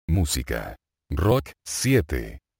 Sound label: rock